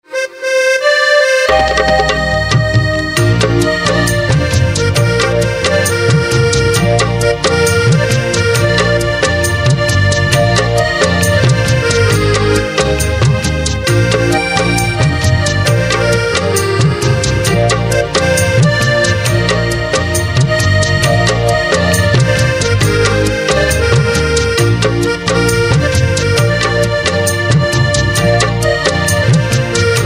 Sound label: Accordion